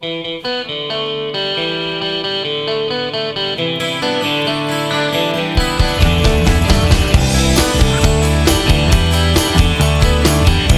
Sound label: GTR RIFF